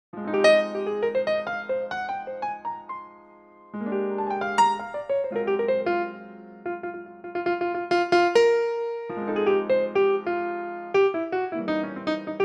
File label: Improvisation; NI New York Grand